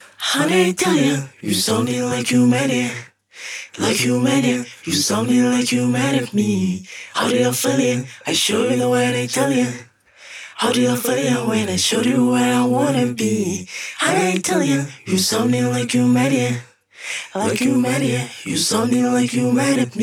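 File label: Pop RnB F sharp m 141, Acapella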